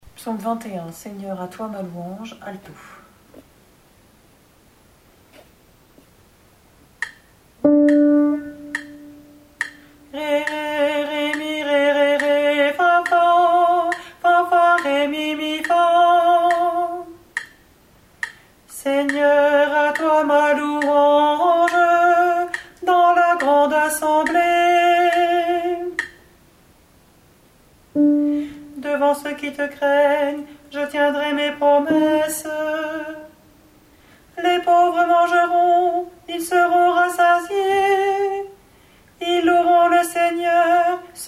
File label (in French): Psaume 21 Seigneur à toi ma louange ALTO